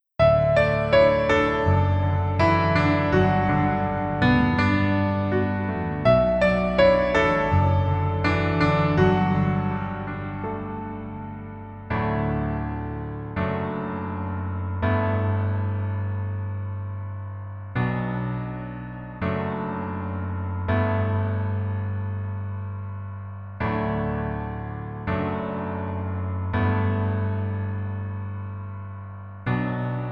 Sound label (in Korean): Piano ver, -1키